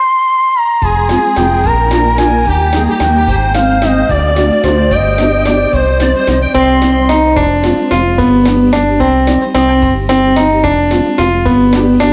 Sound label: karaoke; минус детской песни; минусовка